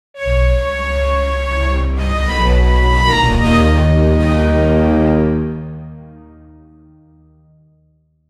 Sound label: starts with a cinematic, phonk